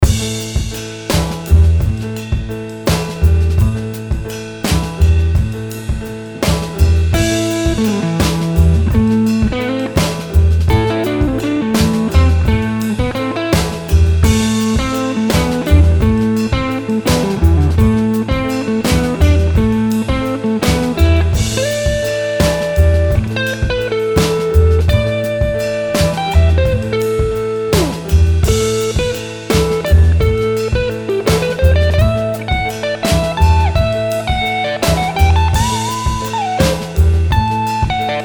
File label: improvising mix